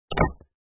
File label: drop; grade5